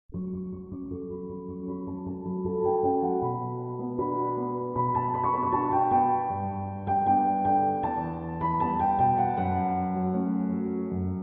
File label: trap remix